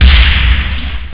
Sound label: bass, drums snare; OctaMED SoundStudio V1